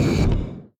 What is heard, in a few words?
flamethrower end; fight